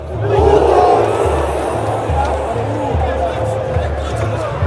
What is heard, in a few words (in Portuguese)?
uhhhh torcida